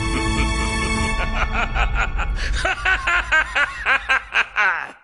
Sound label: ending laugh; tekken 4; kazuya